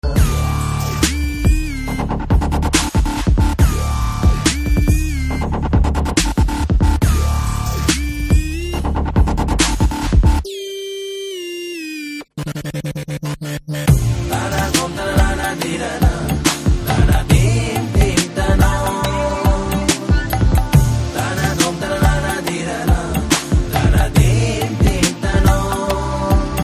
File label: BG Beats Male